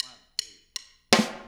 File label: Intro Full Mix, drums, 162 BPM; Drums from the Big Room The Mixes; Take Your Toms Off